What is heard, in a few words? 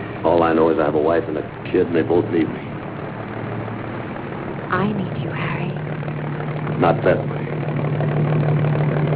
rain